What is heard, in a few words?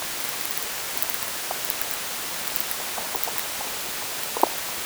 pond